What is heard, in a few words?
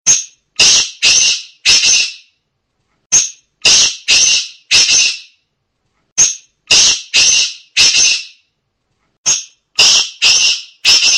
black francolin; animals